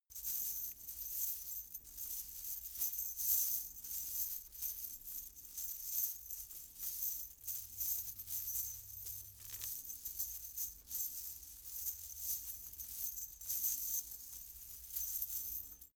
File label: chain mail handling